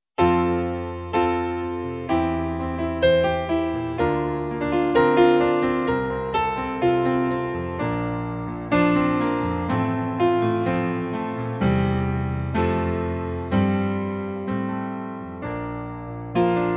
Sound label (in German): F Dur 63 Bpm